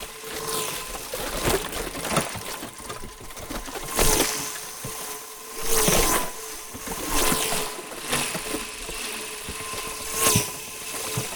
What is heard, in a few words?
connect loop